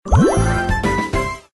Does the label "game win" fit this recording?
Yes